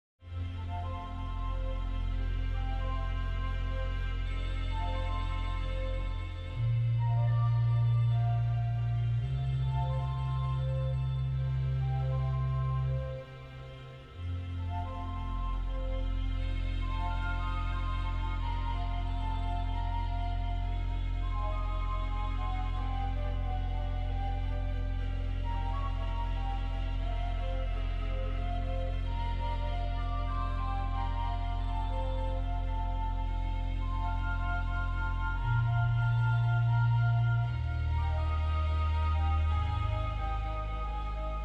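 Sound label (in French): Orgue de l'église Saint-Roch; Paris; Cavaillé-Coll, 1846-1858; "Choeur de Voix Humaines"; Louis, James LEFEBURE-WELY; organiste; Françoise LEVECHIN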